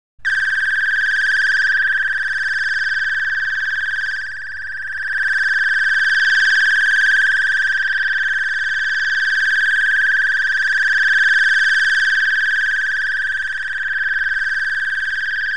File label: Alarms, Electronic Alarm Rapid; BBC Sound Effects Library; Explosions Guns Alarms